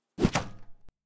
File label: Arrow Impact